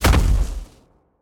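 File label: movement footstep; smite; god cthulhu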